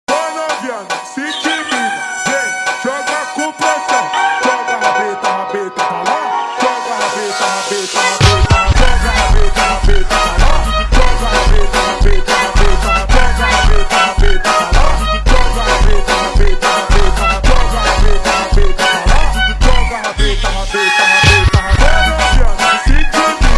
Funk slowed

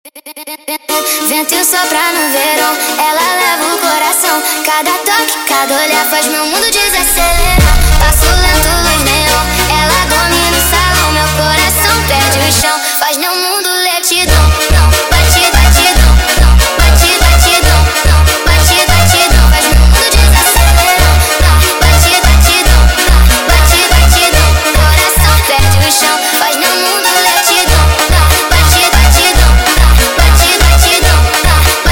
Montagem sped Up, funk

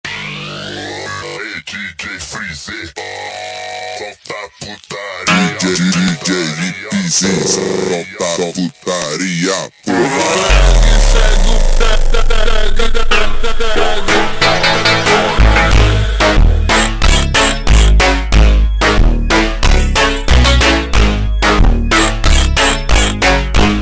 ultra Slowed, funk

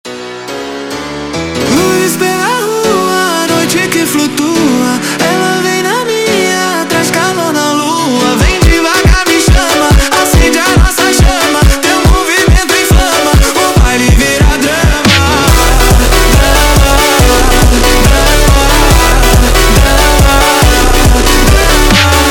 funk

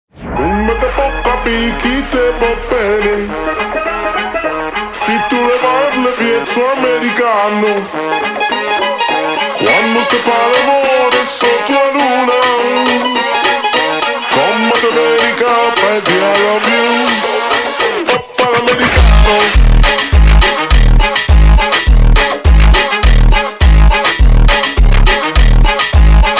Americano Funk super Slowed

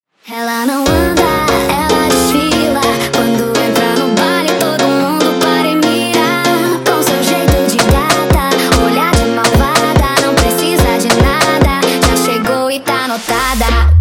sped Up, funk

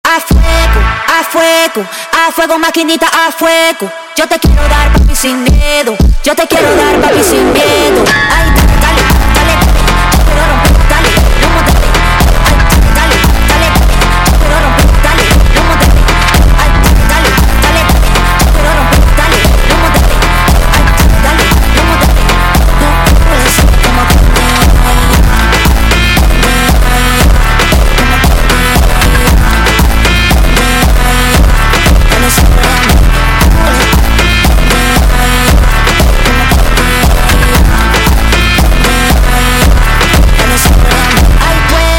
Montagem Hardstyle, funk

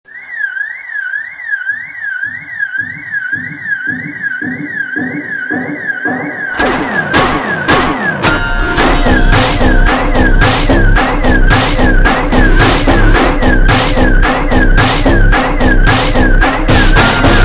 Hardtekk ultra Slowed; funk

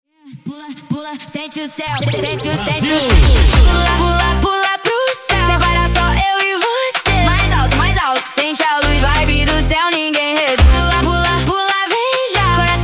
Jumpstyle sped Up

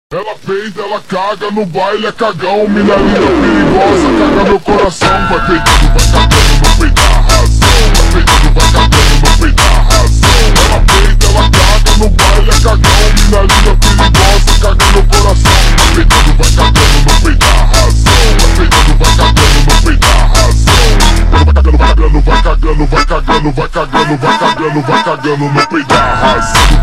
Tekk ultra Slowed; funk

